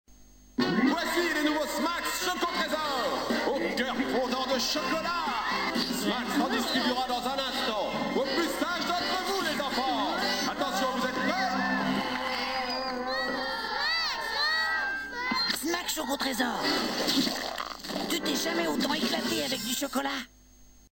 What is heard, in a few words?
Smacks